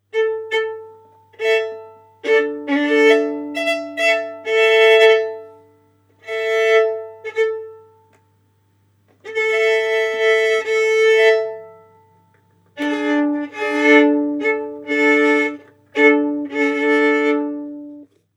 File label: Violin; Air 2236 A.D. Original Soundtrack